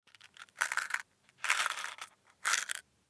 Lutschbonbon

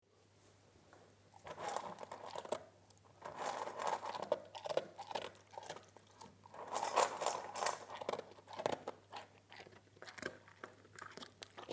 Hund frisst

